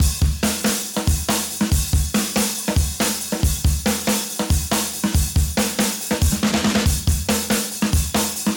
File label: Drum Glue Effect Engaged; De-Esser